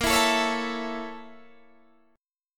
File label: A sharp Minor Major 7th Flat 5th, down, Guitar, Standard; strummed; JGuitar